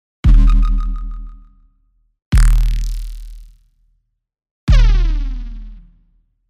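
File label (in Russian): громкие звуковые эффекты, трейлеров; 3 vida trejlerov impulsnih filmov shokiruyushie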